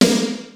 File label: R8 ELECTRONIK; Rubber Chicken Software Sample Bank; DRUMS + PERC